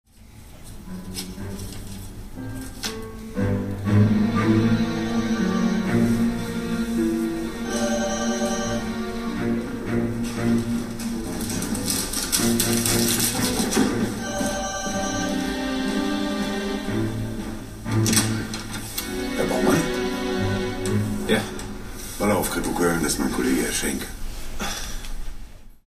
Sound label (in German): streicher; tatort